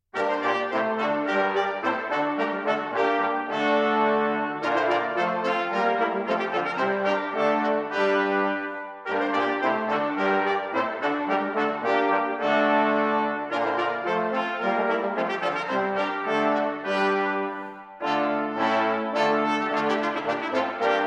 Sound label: Brass Ensemble; The Choice (Almand)